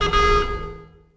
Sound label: Train horn